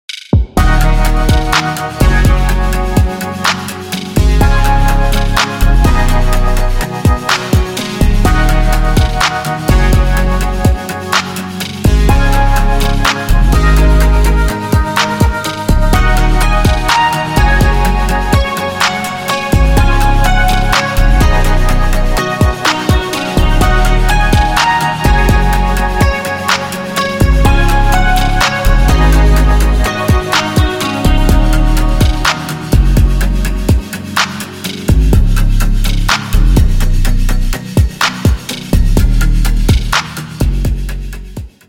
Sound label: Instrumental